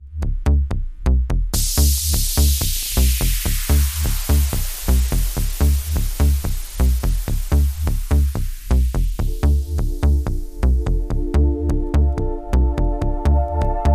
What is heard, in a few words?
electro